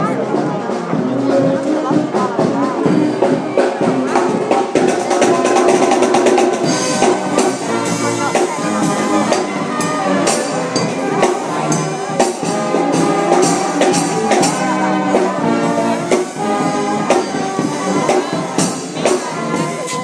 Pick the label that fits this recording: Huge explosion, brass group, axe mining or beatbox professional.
brass group